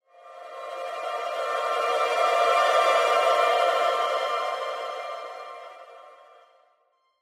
Stinger Light; Angry Birds Star Wars 2; Crimson

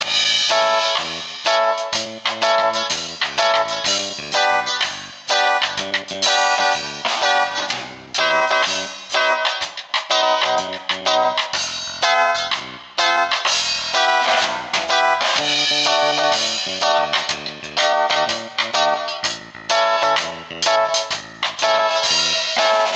Jukebox Reggae

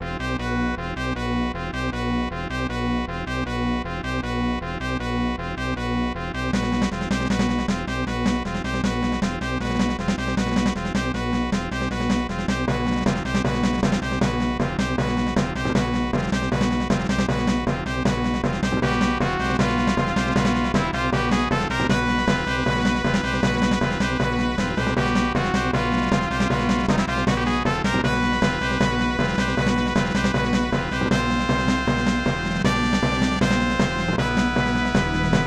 Battle with Flying Creature

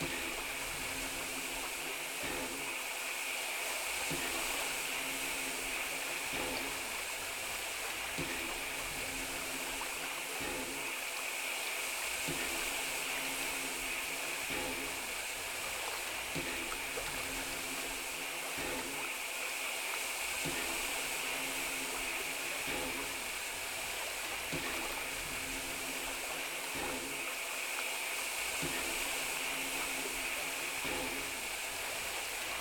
Scot Bridge Morn; The 39 Steps

